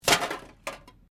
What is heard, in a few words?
Can Softdrink Rubbish; various metal cans; Metal (Sound Effects:Materials)